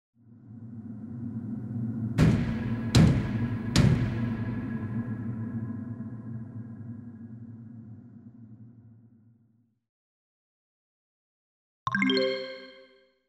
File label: Three Ghostly Knocks; Mystara; TSR 2502 Hail The Heroes